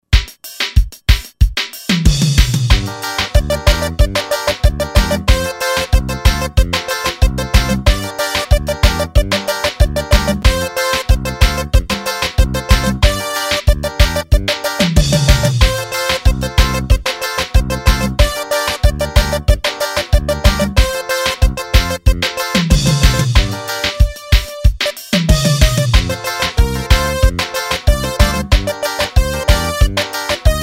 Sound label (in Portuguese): pisadinha